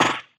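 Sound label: impact bullet generic titan shield; Titanfall 2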